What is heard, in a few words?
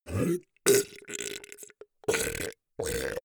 beast choke deadly